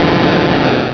Cry

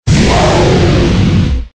Cry

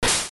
menu; Pokemon Essentials; Pokemon Iberia V1.03

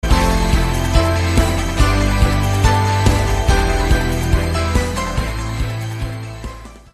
Evolution Success; Pokemon Essentials; Pokemon Circon BETA 2 v1.8

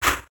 GUI party switch; Pokemon Essentials; PKMN Estelar 1.3

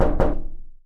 door iron knock; easyrpg; WitchsHeart

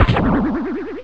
super spring; SuperMarioWar

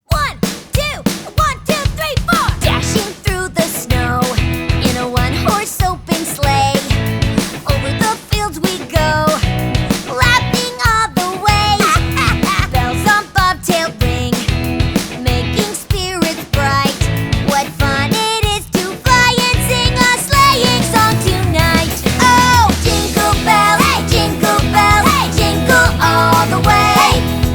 Jingle Bells; My Little Pony; It's a Pony Kind of Christmas; Rainbow Dash

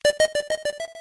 Level Dropped; Voltorb Flip; Pokemon Essentials; Pokemon Iberia V1.03

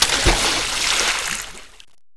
splash big; easyrpg; WitchsHeart

